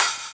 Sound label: DR. LOOP, DRUMS; X-Static Goldmine 3